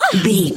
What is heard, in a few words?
voice female hurt pain mild